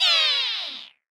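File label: In Her Image damage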